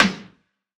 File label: Snare, Interesting Drums